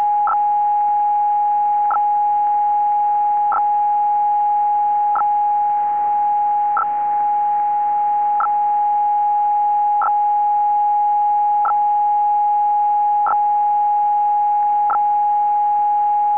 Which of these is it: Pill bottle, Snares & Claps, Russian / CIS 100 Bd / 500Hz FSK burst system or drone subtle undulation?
Russian / CIS 100 Bd / 500Hz FSK burst system